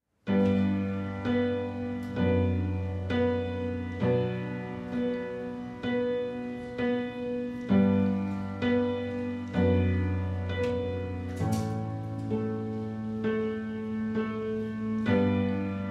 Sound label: acoustic version